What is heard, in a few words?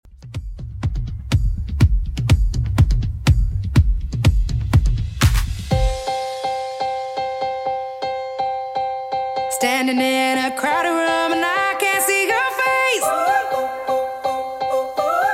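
bass house 123 bpm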